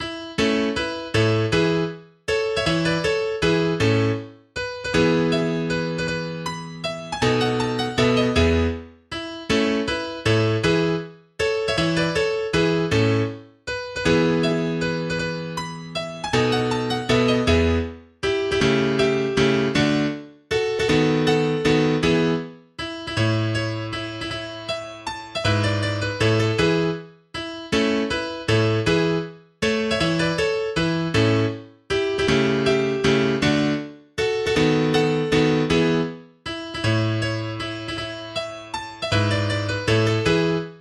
string quartet

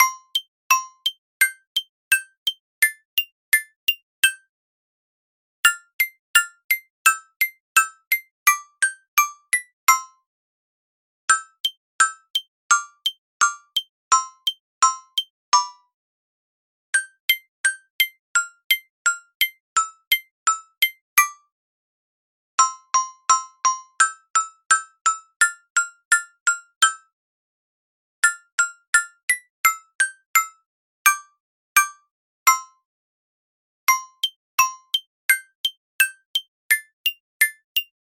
Twinkle Little Star, MIDI

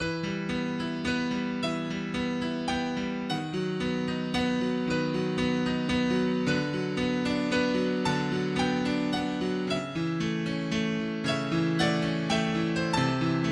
Harp

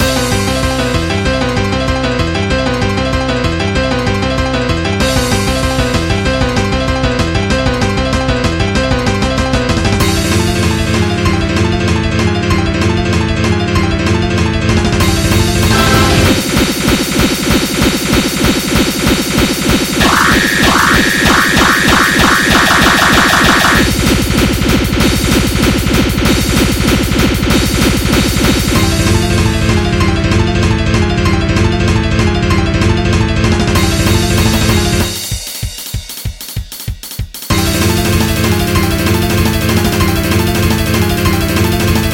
Piano Hands

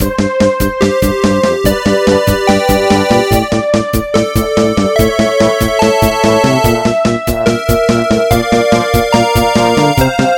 Game Over

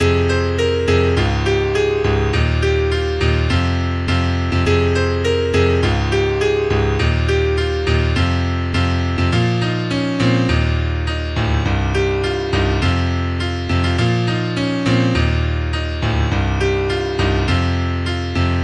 Piano

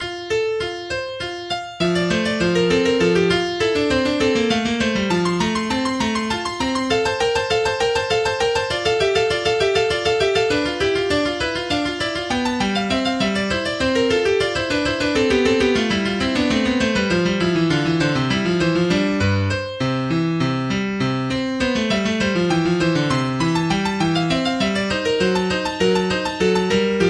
Invention No 8 in F major; Bach BWV 779

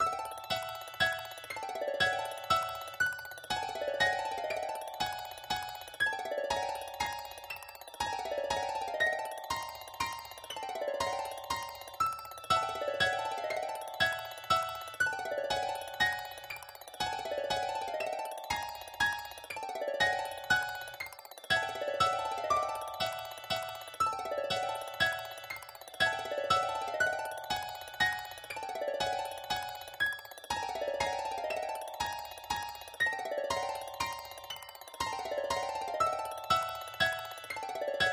Distant Carousel

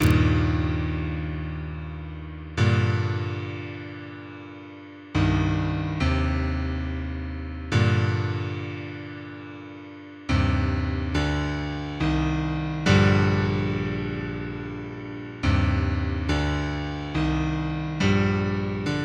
Melodramatic Music

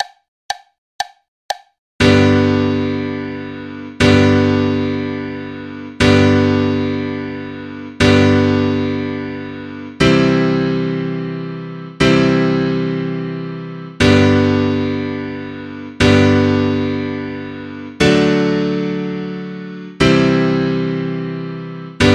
Jazz Feature

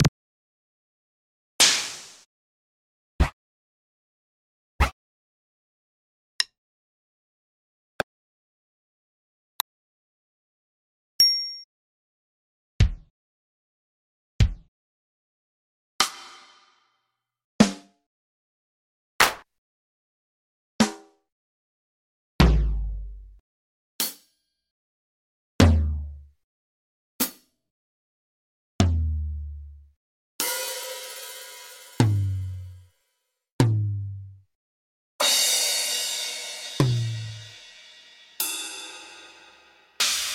Percussion Test

